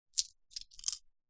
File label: money coins small x4 handling short; foley; zapsplat